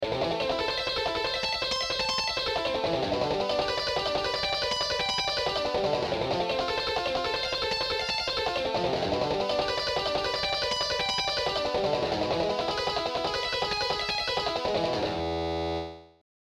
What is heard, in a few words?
Sweep arpeggios study; Sweep Excercises